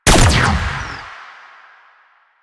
Fire Shot, Weapons; Duviri; Incarnon Adapters; Incarnon Cestra

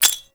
Sticky Bomb Pin Pull, Weapons; Grineer; Veilbreaker

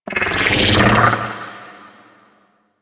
Arachnoid Wraith Cloak Activate; Warframe; Corpus; Abilities

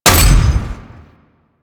Cross Bolt Fire

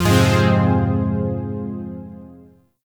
Shawzin Min Chord G; Chords; Warframe; Zariman

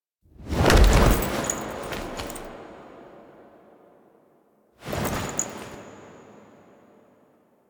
Drifter Foley A; Warframe; Quests; The New War; Sealab; Hunhows Lair; Hunhows Lair Part One

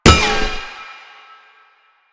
Tank Shield Impact; Enemies; Warframe; Lasrian

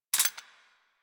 Emplacement Mech; Grineer

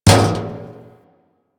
Physics Barrel Impact Medium, Metal Barrel; Physics Objects

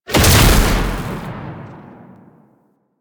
Proj Explosion, Weapons; Warframe; Fulmin Prime

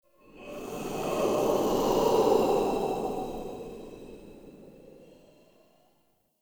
Corrupted Wind Gust; Ambience; Mendicant Area

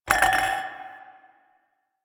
Glasses Clink; Warframe; Quests; TheNewWar; Ambience; Zariman

